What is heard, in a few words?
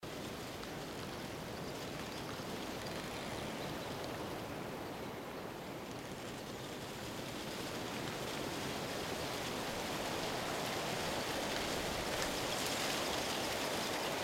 alive fire Off; Blind Quest - The Frost Demon